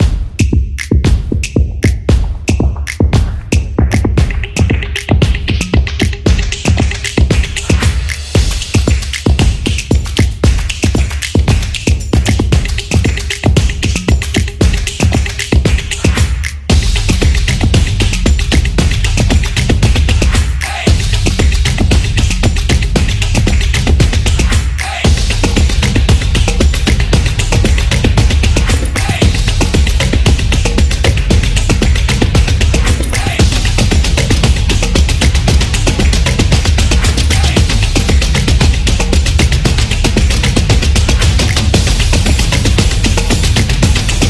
Drum Beat

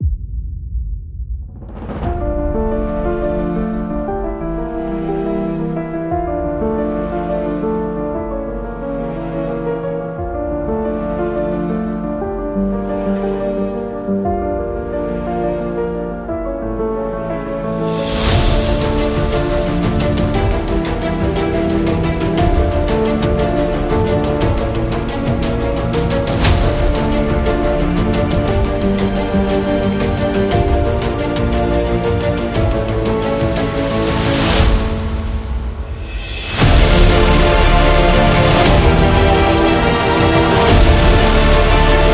Dramatic; epic action

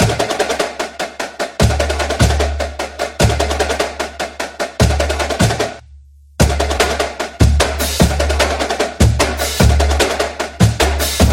Drum Roll; crossfit

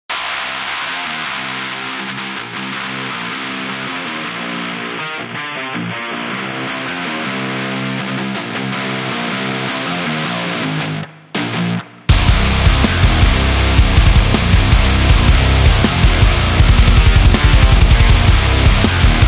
Explosive, metal

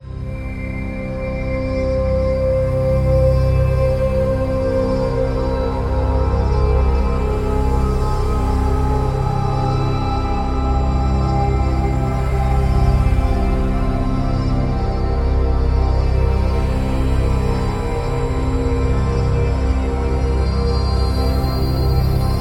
Healing Meditation

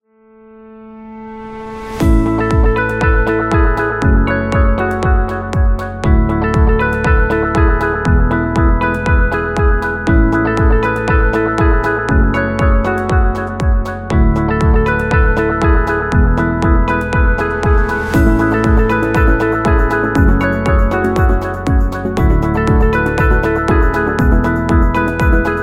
Corporate Office; corporate music vol 13

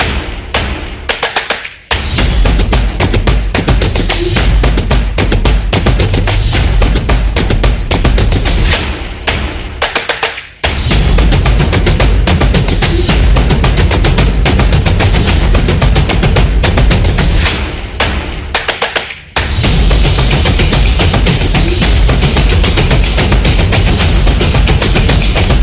Urban

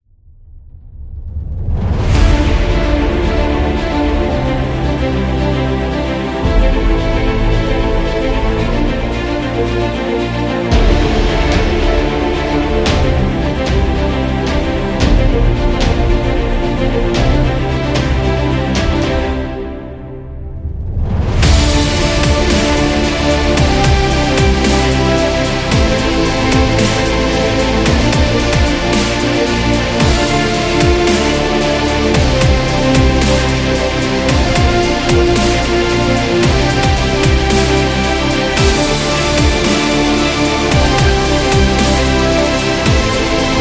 Cinematic Dubstep; epic music vol 2

